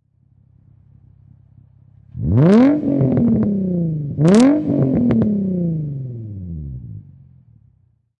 Infinity G35 Exhaust Double Rev, High Performance Car; Auto, Power Car; Exhaust perspective; Revving twice; Race Car; Lynne Music